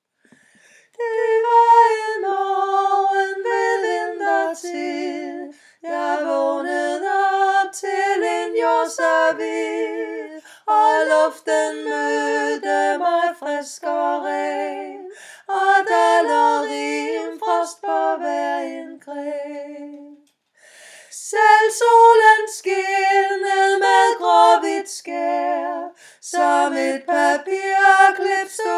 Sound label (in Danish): tutti